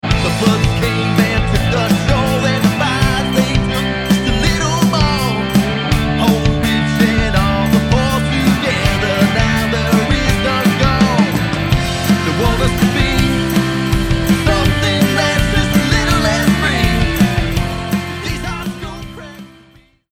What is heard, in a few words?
ROCK LIVE